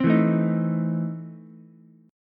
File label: E Major 7th Suspended 4th Sharp 5th Ukulele; EM7sus4#5 strummed